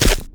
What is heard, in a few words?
grass, footsteps